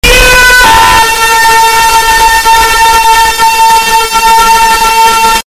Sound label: scream ear rape; mike wazowski